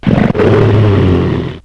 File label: bear growl